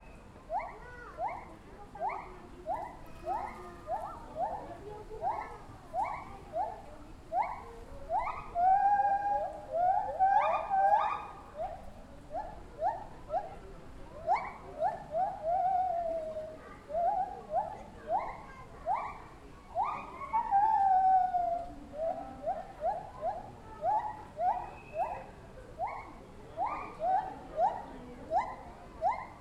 Zoo Ambience with Distant White-Handed Gibbon Calls; Ambisonics